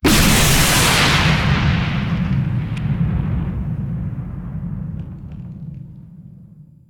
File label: Lightning Strike Impact; Evolve; Kraken